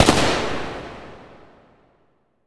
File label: Shoot, FX